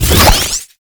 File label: Mine Launch; Tau; Twelve Minute War